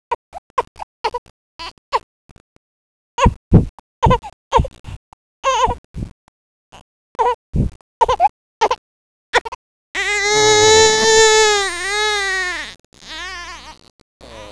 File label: crying